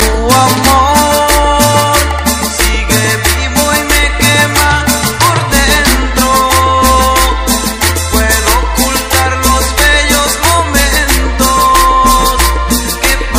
cumbia

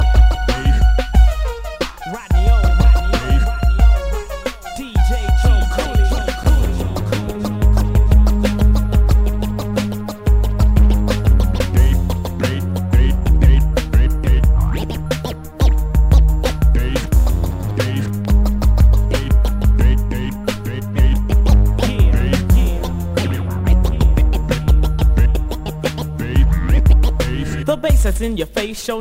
old school rap

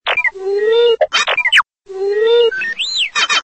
incoming call; ringtone; star wars r2-d2

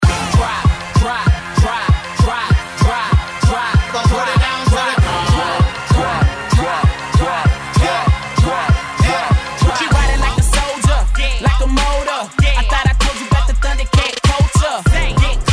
drop

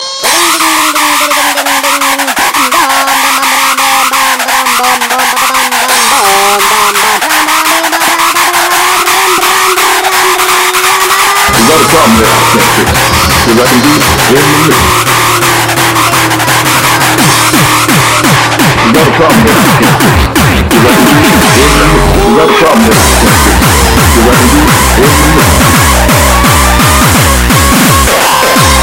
hardcore remix

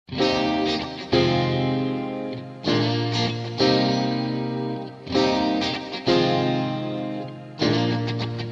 guitar sms

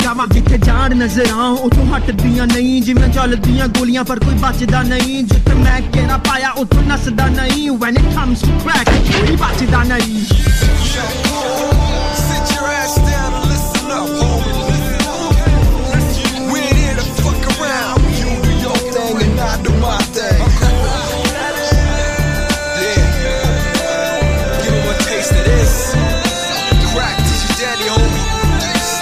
crack rap

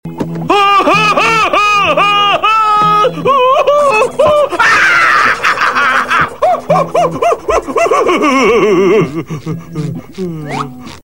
laugh; spongebob; patricks laugh

